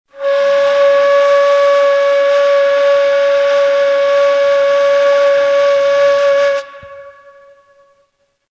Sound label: Buselik, Neysample